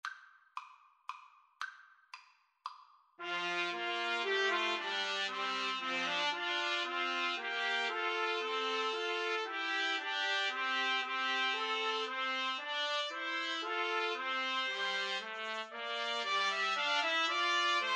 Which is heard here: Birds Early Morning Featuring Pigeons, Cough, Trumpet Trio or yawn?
Trumpet Trio